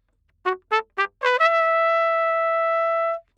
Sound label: Trumpet